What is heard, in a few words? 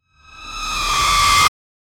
dark trap riser, cyber